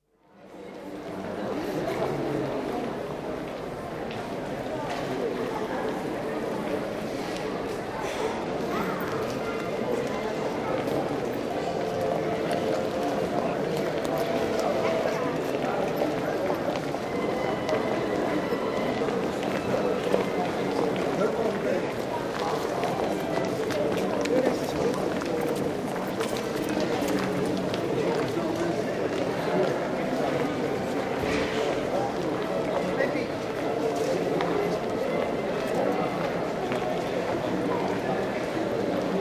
Pedestrian precinct, Prague, footsteps, some distinct speech (Czech), distant music from busker; BBC